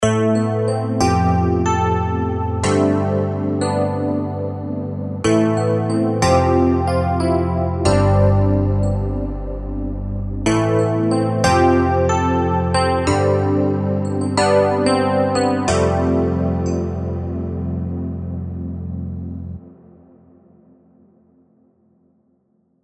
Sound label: mks80 chorus; wingmen; morphoice